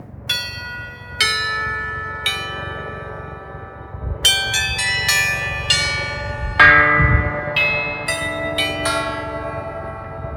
composition for bells helicopter wind and motor vehicle; weeklybeats-2018